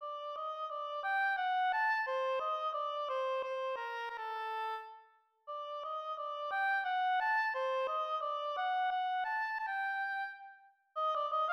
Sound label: Oboe